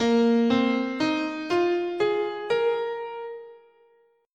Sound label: A sharp Suspended Pentatonic, scale